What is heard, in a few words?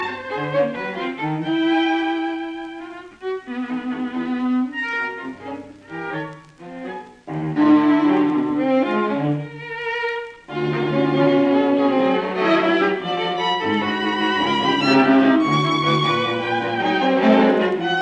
String Quartet Intermezzo Allegro moderato; Schoenberg